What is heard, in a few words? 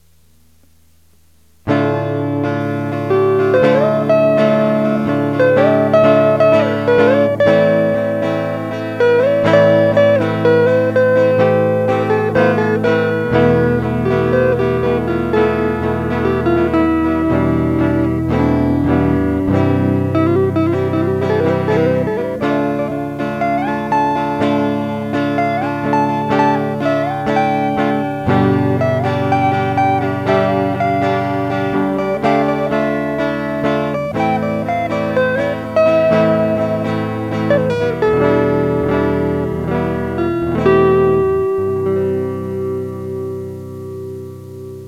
psychedelic solo